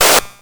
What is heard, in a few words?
Electron Sidstation, Drum Machines